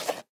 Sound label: pickup, figures